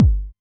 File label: 909 Kick, Drum Machines; Novation DrumstationV1